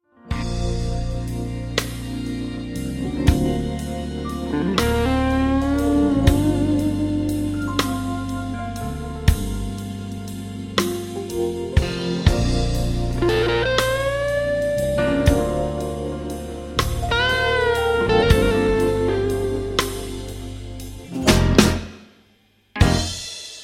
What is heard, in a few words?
Gospel